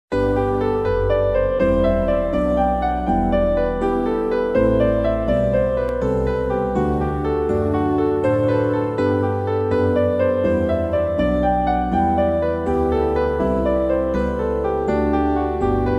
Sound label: piano harp